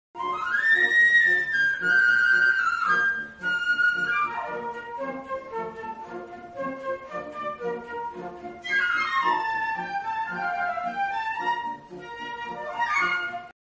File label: concert, flute